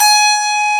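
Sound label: harpsichord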